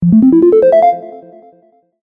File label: alert going up ringtone